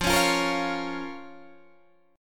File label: F Suspended 4th Sharp 5th F down Guitar Open D